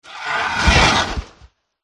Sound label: mini dragon death